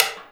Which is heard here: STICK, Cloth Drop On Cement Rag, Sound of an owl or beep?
STICK